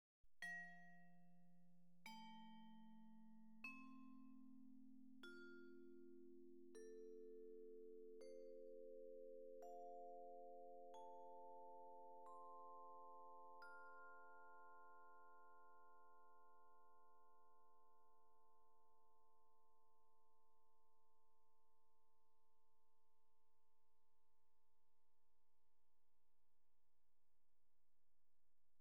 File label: Converter Resolution Tests: -40db No Dither